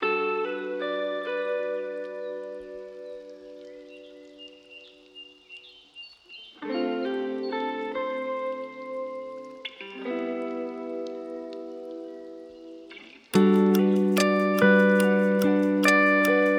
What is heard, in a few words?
lofi hip hop